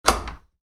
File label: Latch, Metal: Release Latch; Latches; Objects; Stuart Duffield